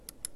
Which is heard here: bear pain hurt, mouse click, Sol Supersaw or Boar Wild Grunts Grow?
mouse click